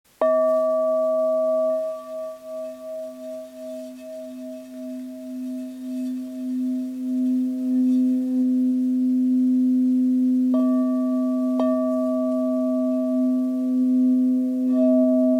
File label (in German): Hörprobe Oxygen 24cm C